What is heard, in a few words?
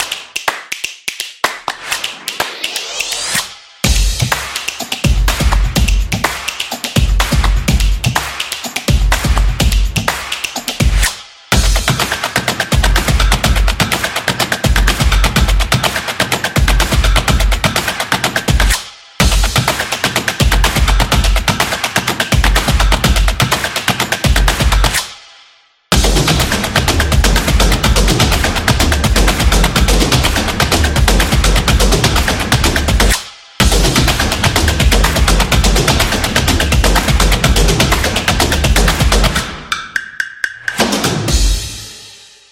Clap Drum